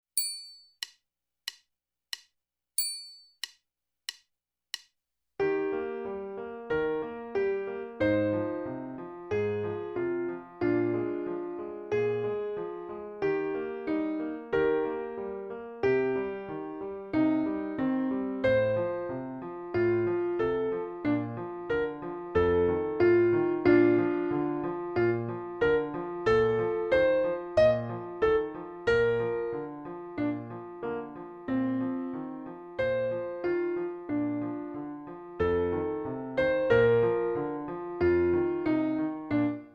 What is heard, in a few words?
Sonatina - Flute